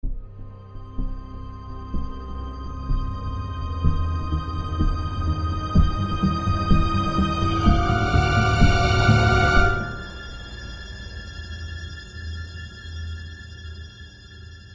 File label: Linear Suspense